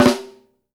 FLAM, SNARES; Sampleheads; New York City Drumworks VOL-1

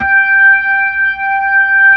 B-3, Keyboards of The 60's and 70's, B-3 Brite; Xfade

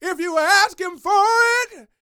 Vocal Gospel; Vocal Planet Vol1 Gospel

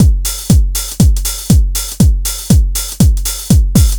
DRM, House loops 120; Best Service ProSamples vol.10 - House; AKAI

